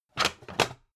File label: pan take hard